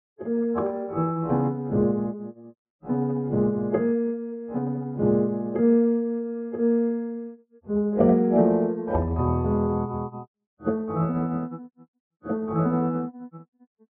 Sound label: left Hand Wiener Filter; Ravel Jeux D'Eau; 2015 WASPAA Separate And Restore